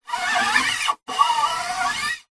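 musical guitar acoustic nylon hand slide fret board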